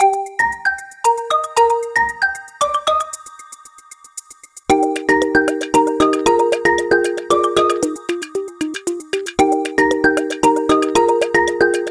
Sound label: Oppo A16k Ringtone